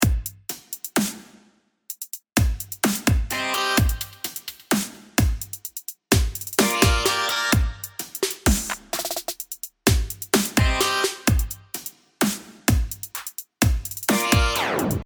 Second Drop Drums

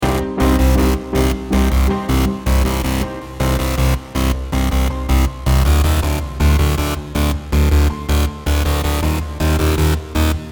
Drop Bass

